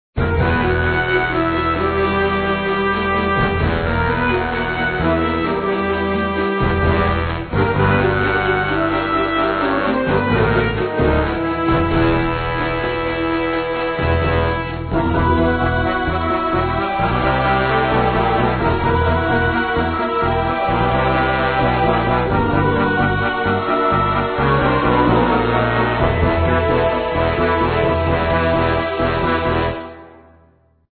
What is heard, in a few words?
Arcane Victory; Magictower2013